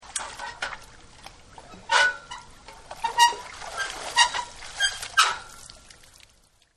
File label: Swimming Pool